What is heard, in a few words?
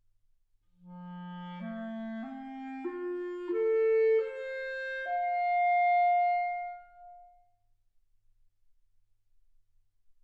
clarinet